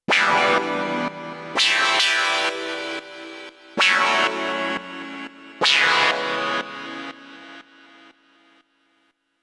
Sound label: Pulse Chord; KOPRO+